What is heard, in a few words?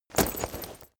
leather metal impact chink